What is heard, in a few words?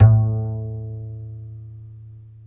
upright bass a3; Upright Bass Scale